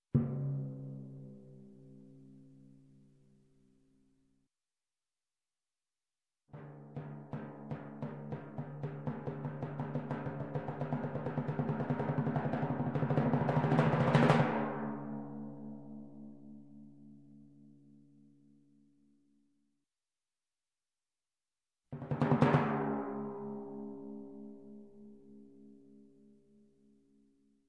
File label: Timpani roll, medium Hit, Music, Percussion, Drum; Drums; Sound Ideas; Series 2000